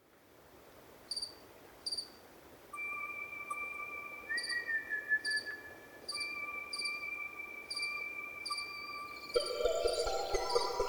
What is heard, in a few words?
Dawn chorus from a rare pinsapo (Spanish fir) forest in the mountains of Andalucia.; Marc Anderson; Wind Is the Original Radio Fridays